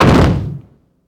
Soldiers

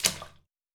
Puddle

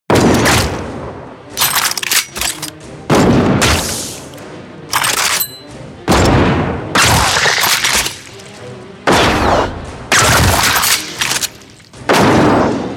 Gun SFX Pack

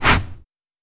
Sand gravel

